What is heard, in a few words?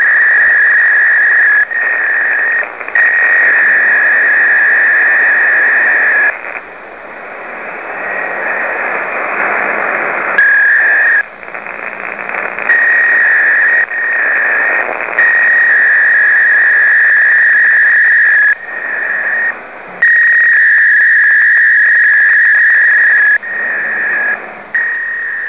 hf packet